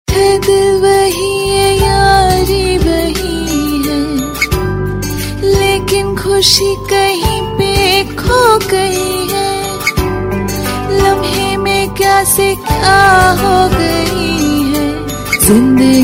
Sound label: Sad Tune; Nisha Aur Uske Cousins